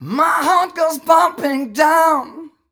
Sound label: m 128 mel b-flat, Vocals